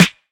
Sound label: rich lows steel snare drum sample f key